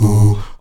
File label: VOX AHOO, Afro Chants, Stacc Ah Oos